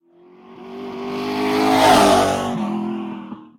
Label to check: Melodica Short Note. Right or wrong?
Wrong